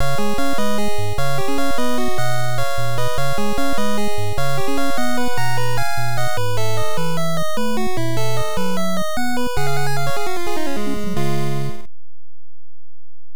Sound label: Mission Complete ~ Game Over; Star Soldier; nes